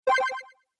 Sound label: SNES Fighting Select